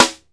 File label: clicky snare single hit b key, snare drum sample tuned to the B note